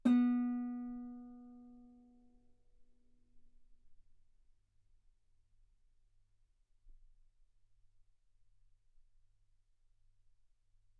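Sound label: Guitar ff B string B3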